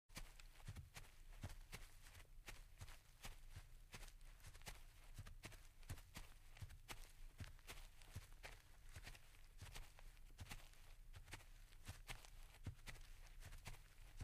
Footsteps On Grass Do

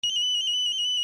pager alarm, beeps

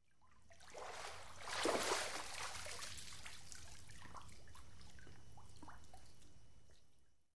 Swimming; Swimmer Getting Out Of Pull.

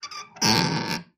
cabinet door screech; cabinets

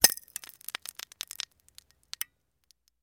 Camera Flash Bulb Sm, flash pops

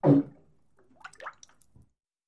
Bathtub Fart Shrt S

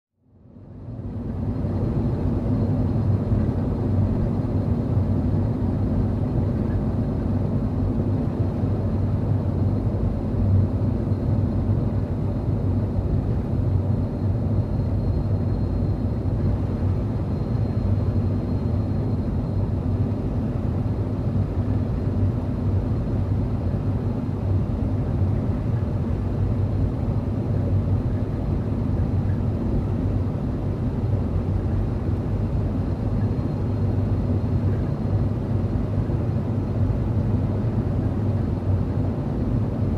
SUV Drive At 25 35 Mph

